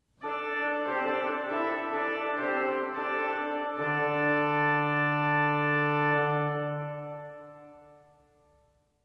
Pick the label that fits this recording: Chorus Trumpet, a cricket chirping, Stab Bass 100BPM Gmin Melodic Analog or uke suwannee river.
Chorus Trumpet